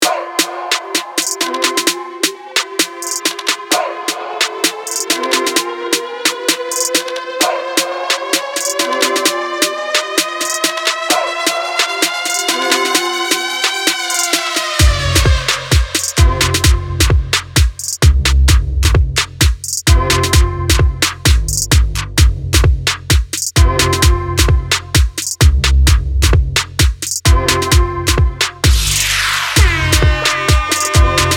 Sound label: Junk Drum Riddim